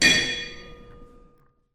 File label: starting gong